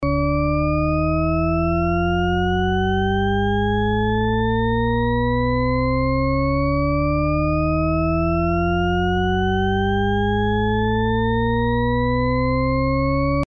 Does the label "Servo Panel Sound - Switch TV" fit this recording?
No